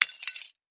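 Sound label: Tile Debris Hit Hammer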